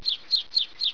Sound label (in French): oiseau